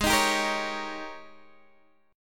G sharp Major 7th Suspended 2nd Suspended 4th Guitar Open D